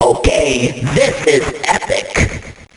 epic; jevil

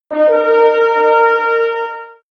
horn; shovel

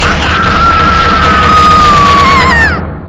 breakdown; nemu

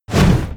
kick; gunvolt

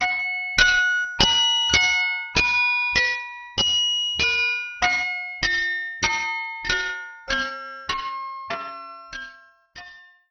music box; laetitia

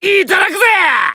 snakebite

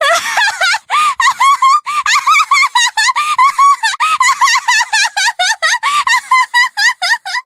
cackle; erika

